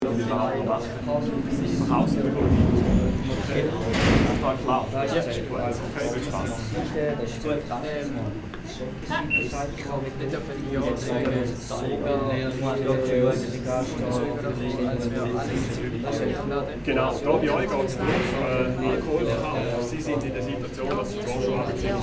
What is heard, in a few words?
Busy classroom ambience student walla